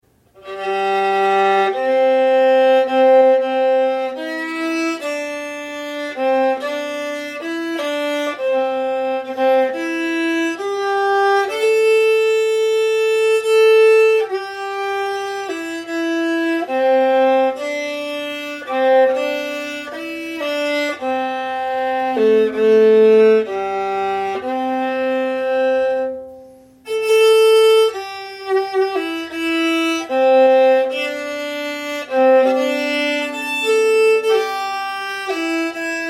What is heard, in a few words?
Auld Lang Syne Viola; altola viola